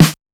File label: Snare Loud, classic machines dx